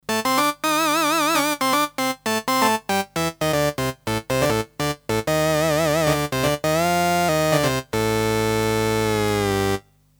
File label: Dunlop MXR M222 Talkbox fun lead clean